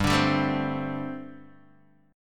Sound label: G Suspended 2nd Suspended 4th, Guitar Open D